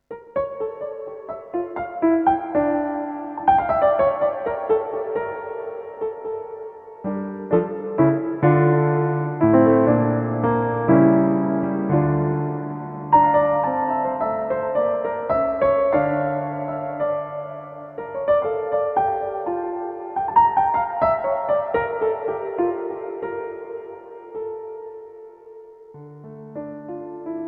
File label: Concert Hall; CP1 V Verb